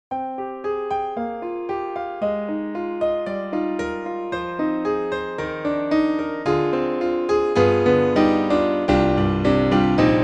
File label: Roland INTEGRA-7 Full Grand 2 (SuperNATURAL Acoustic Tone)